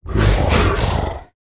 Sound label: Canine Breath, Monster Sounds; The Decline Of Warriors; Monster Sounds Volume II